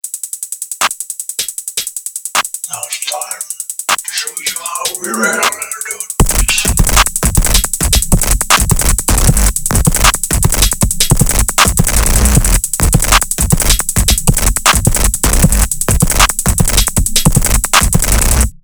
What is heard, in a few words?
worst 808